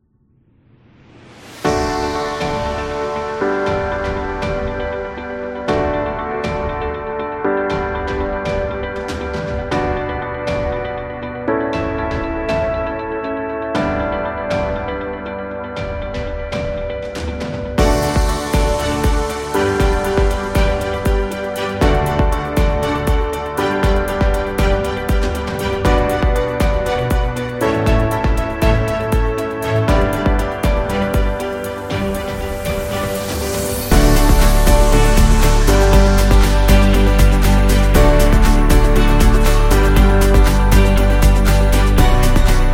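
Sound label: Food Industry, Upbeat and happy background music; happy kids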